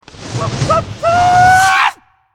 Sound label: Character White Rageattack; angry birds epic android ios mobile 2014